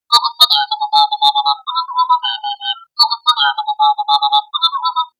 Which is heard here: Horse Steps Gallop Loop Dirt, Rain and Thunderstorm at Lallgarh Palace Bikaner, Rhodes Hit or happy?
happy